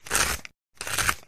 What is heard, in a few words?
Pepper Grinder Vari